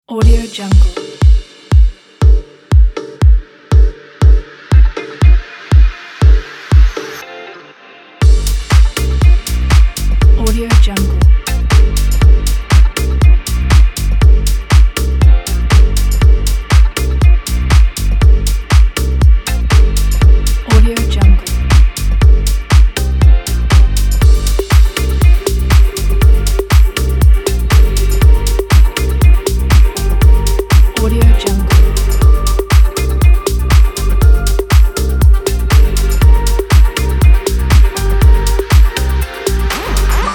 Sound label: elegant